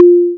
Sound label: xylophone; DumbDrum